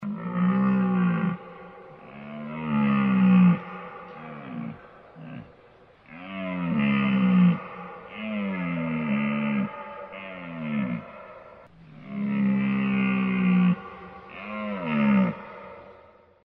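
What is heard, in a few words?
deer roar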